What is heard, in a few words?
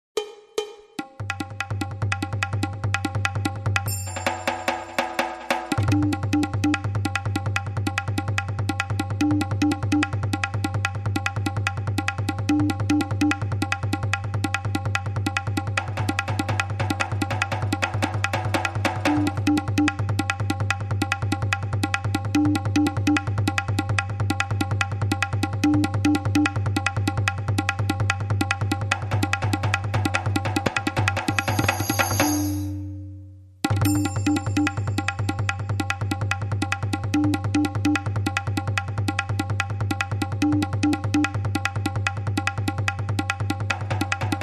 Indian Loop, No Sleigh Bells